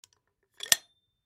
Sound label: Gas Gun 37mm Load Canister; Warfare; Gun Shots (Sound Effects:Guns); Blastwave